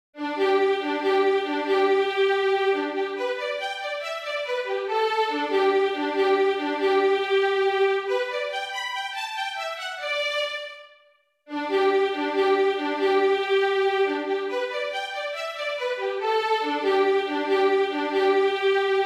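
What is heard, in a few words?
Little Hunting Song Violin 1; Schumann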